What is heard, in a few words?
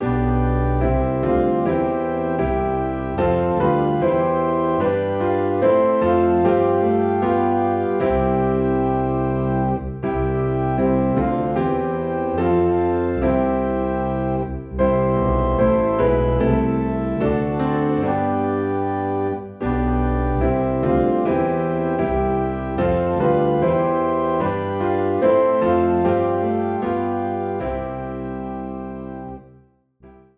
Piano and Organ in C; 4 Verses; A Charge to Keep I Have